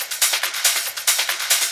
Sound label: Loop 140 BPM; vengeance essential club sounds-3